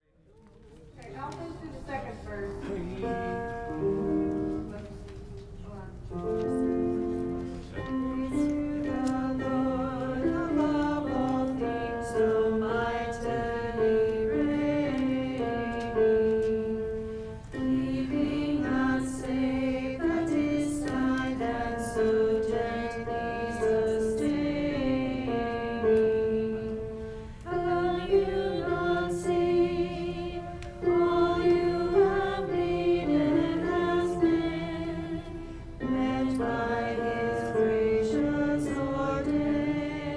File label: alto; Praise to the Lord The Almighty; Gather Hymnal